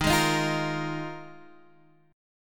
Eb Suspended 2nd Suspended 4th, Guitar Half Step Down